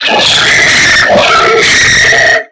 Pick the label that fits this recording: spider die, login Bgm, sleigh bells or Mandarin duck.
spider die